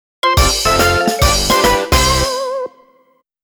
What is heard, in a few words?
Goal; DX2 Ex Stage2; bike rider dx series super bike rider soundtracks 2021